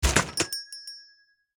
Negozio closed; BLIND QUEST - The Enchanted Castle

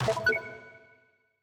Obelisco di Cristallo; BLIND QUEST - The Enchanted Castle

